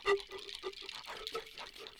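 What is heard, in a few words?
Music Village Calm Near; from dust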